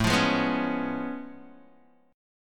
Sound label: A Augmented Major 9th A down Guitar DADGAD